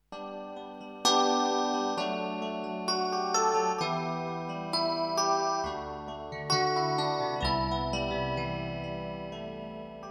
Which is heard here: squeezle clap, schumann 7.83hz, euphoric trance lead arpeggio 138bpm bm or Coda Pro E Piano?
Coda Pro E Piano